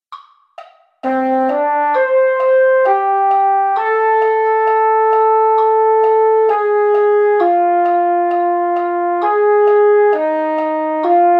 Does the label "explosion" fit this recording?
No